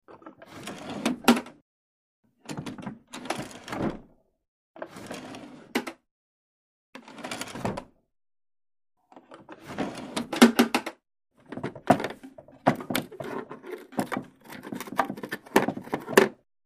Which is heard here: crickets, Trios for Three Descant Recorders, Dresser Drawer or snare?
Dresser Drawer